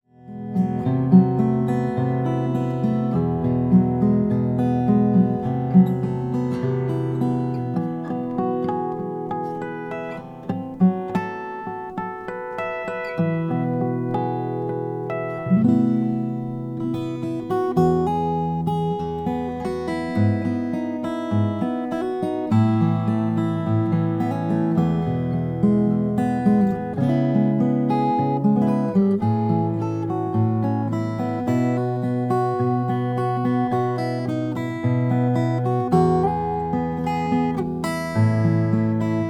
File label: gerber sj honduran rosewood